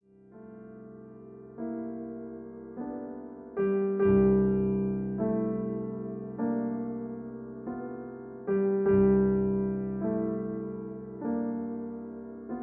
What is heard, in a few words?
Accompaniment C